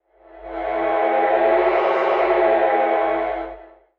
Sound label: Magic, 8 bits; Game Sound Solutions - 8 bits Elements; Magic Demo